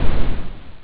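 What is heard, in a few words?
WATER SPLASH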